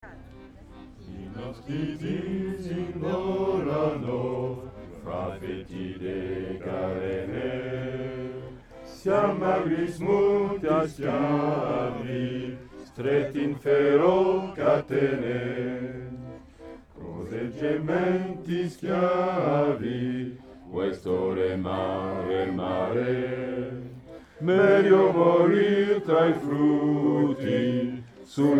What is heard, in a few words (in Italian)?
basse